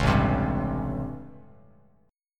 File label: A 7th Sharp 9th, Bass Standard; A7#9; strummed; JGuitar